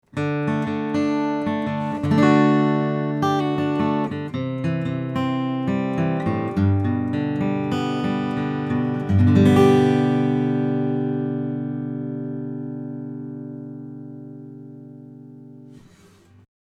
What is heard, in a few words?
Omni Ac Git Picking A; U67; RME UFX